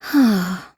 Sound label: sigh